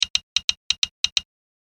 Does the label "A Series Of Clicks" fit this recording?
Yes